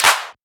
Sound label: Shifted Clap; FLPRO